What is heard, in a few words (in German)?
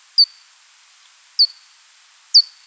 zwerg, Flattertiere; Saeugetiere